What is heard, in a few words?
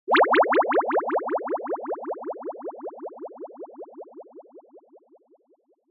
Motion Pop